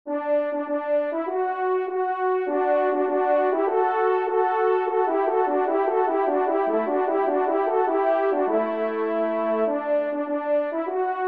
Tambourin Do Maj ENS; 1e Tambourin; Corrette

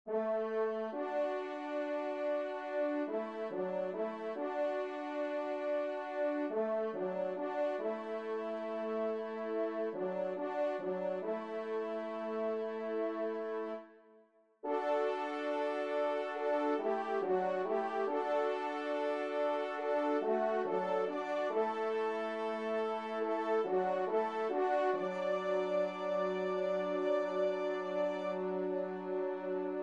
TRP; Vert Galant

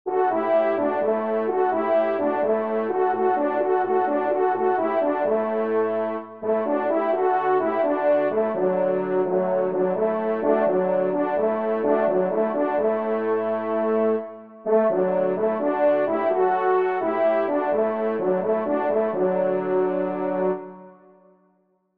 trompe cors; Le Faux Revu

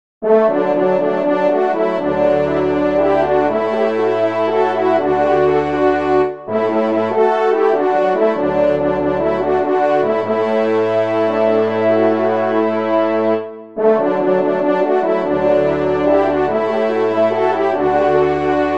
Hymne, trompe; Auvergne; Lac Aydat

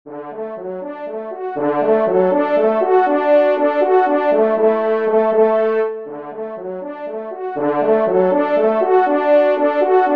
Fanfare; Les Loisirs du Chasseur n°8; Henri Sénée

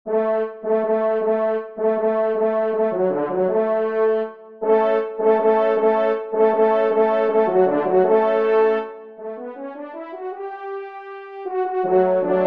trompe; La Bourguignonne; Sombrun

